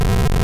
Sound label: dungeon shake; Zelda